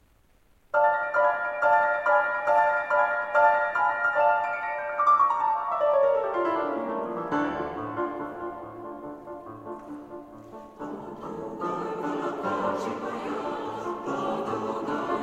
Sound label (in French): Les clochettes; enregistrements concerts; Concert Crozon